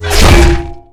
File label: bullet metal; Ed Edd n Eddy - Adromulus Pack